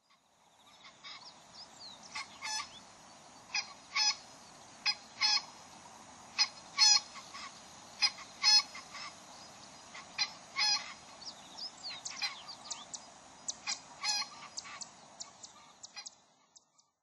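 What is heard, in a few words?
Green Pheasant; キジ; 愛知県西部; kiji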